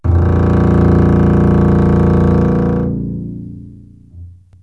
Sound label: bass